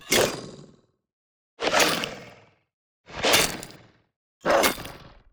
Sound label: Attack; Droval